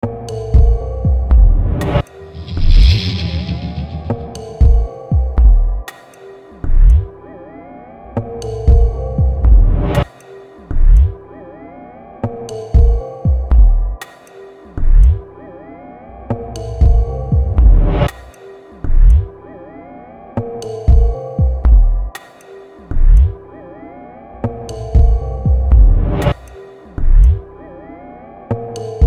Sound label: BEAT AMBIENT, Beat Loop Ambient Soft Relaxed Erotic Electronica; Miscellaneous Loops; Blastwave